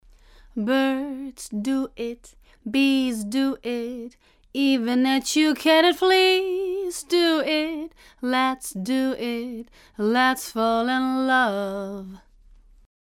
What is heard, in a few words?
Vocal Dry